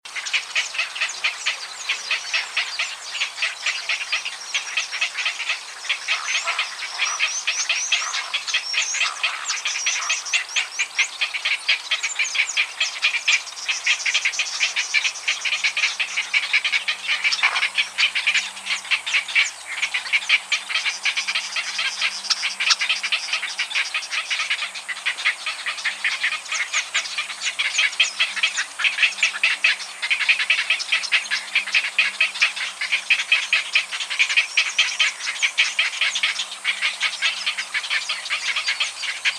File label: Heron Sound